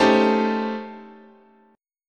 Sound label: A 7th Sharp 9th Flat 5th, chord, guitar